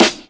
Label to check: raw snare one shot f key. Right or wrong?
Right